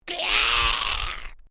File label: Created Creature Small Cry, Alien Sound Effects